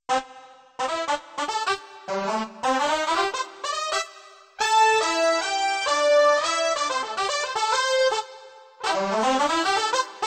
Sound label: brass, Yamaha PSR-530